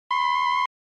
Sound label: Retro Anime System